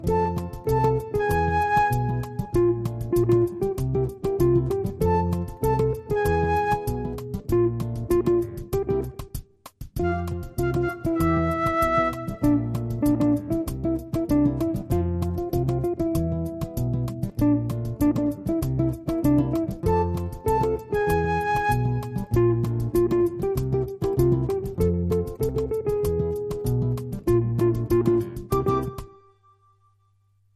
Groove Samba

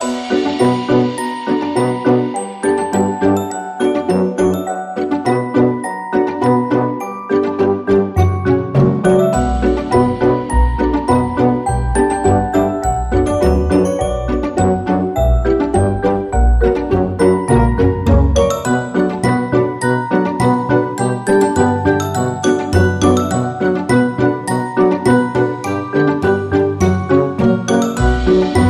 Elephant March

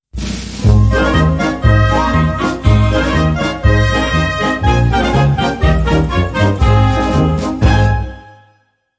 Jingle

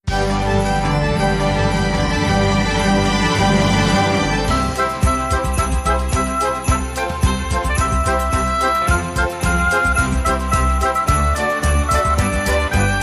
Jingle Bells

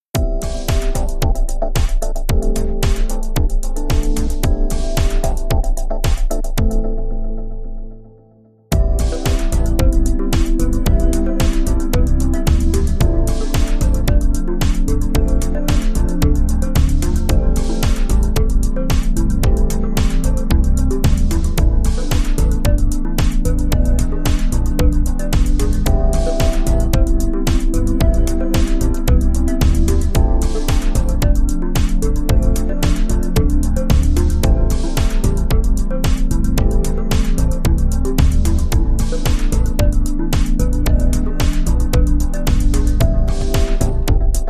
The Trance No Melody

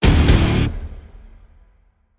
Stab Guitar Double (D)

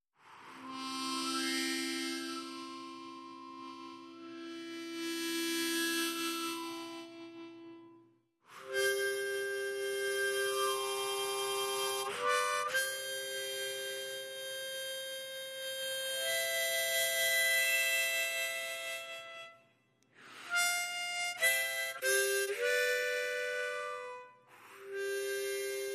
Harmonica Only

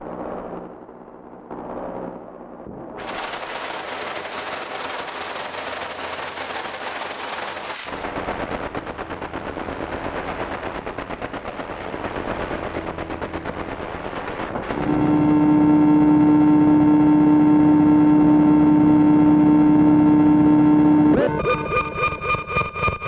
Mech Pulse Musical Pulsers

